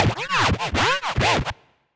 Screech Factor, Synth Rhythmic; Ableton; Factory Packs; Build and Drop

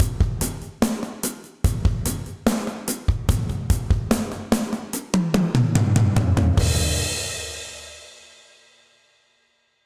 Bricks End Dark Plate Verb 73bpm, Session Drums; End1; Ableton; Factory Packs; Session Drums Club

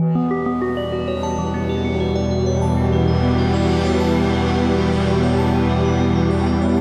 Pensive Pad & Arp - Emin 130 bpm, Atmospheric; MIDI Clips; Tonal; Ableton; Factory Packs; Mood Reel

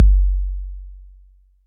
Kick 808; Drum Machines; Drum Hits

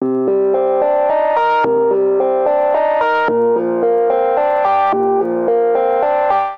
Rise Clav C Minor 73 bpm; MIDI Clips; Tonal; Accompaniment; Ableton; Factory Packs; Chop and Swing

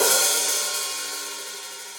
Hihat Open Shank Ambient Loud Punch; Drum Hits; Standard Hits; Ableton; Factory Packs; Session Drums Studio

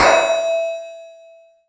crusty; analogBully; Ableton Foundation FreePacks; Wicked Kits

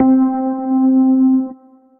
Suitcase, Suitcase Piano; Suitcase HUnited Express; Ableton; Factory Packs; Electric Keyboards

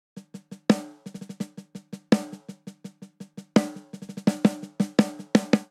Straight Eighths Rock Snare Only 84 bpm; MIDI Clips; Ableton; Factory Packs; Drum Booth

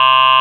android, Digital Synths; Ableton Foundation FreePacks

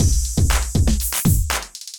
Electrified Kit, Drums; Electrified Kits; Ableton; Bomblastic

